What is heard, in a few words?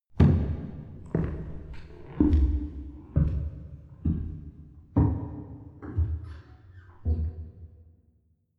step church wooden stairs slow; menschen; schritte